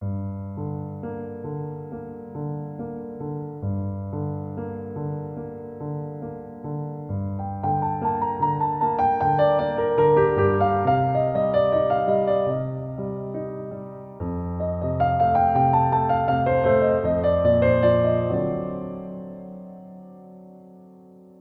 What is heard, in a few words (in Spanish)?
musica positiva para levantar tu animo piano relajante para meditar; chopin spring waltz